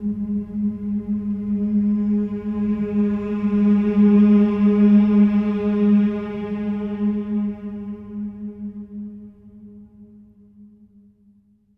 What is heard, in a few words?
MM G#3; SWELL MMS